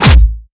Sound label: Scanner Shut Down; Plastic Surgery